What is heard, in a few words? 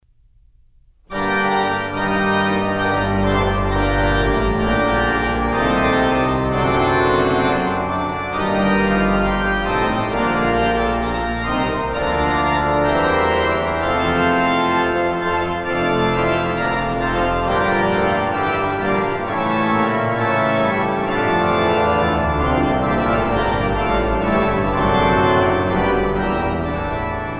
Organ Symphony No. 6 in G Minor